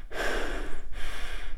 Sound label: female, Realistic Fatigue Voice; oblivion